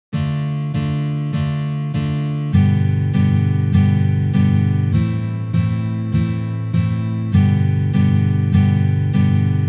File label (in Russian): Uprazhnenie dlya igry akkorda Re minor na gitare